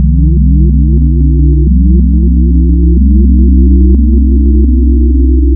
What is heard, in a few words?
Add Partials 4N 03B, wavetables Additive; Architecture 32